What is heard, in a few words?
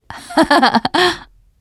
laughter, teen girl; player; survivor; voice